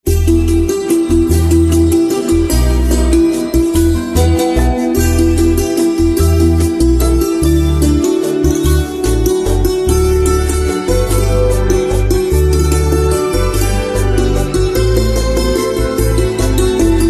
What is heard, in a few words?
Santoor Instrumental Ringtone